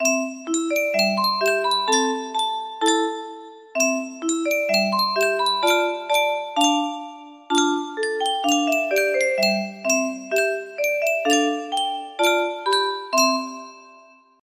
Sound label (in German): Yunsheng Spieluhr